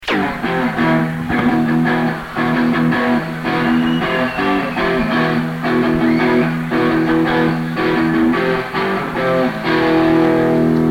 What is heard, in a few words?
Solo